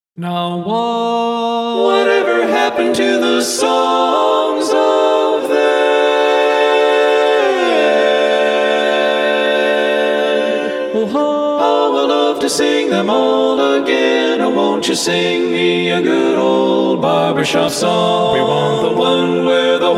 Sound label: barbershop song